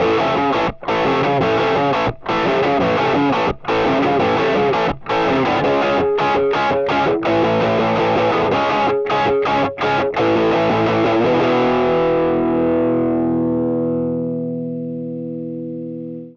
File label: bassement plague, distortion